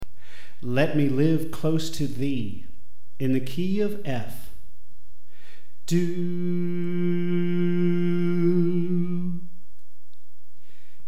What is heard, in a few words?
Alto